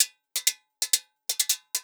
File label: Guira 130